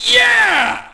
crowd; Tony Hawk's Project 8; XBOX; human

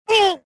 pain; rebel

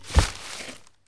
Med step, Dino

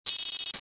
fuelpump; Star Wars Jedi Knight II Jedi Outcast; XBOX

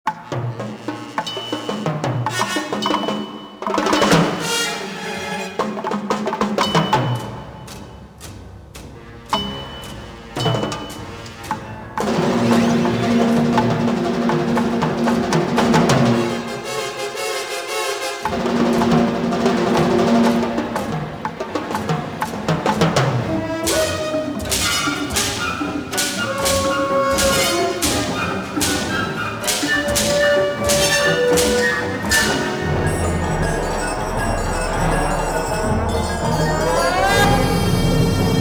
action, music; Star Wars Jedi Knight II Jedi Outcast; XBOX; ns hideout

